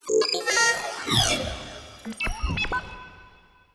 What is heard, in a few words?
portrait machine; Stellaris; toxoids; portraits